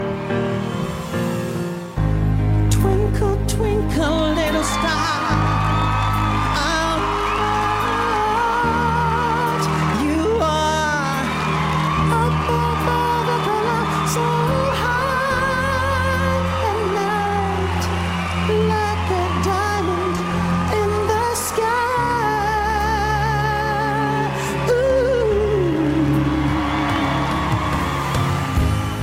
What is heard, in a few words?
Twinkle little star